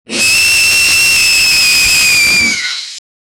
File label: loud electric shock with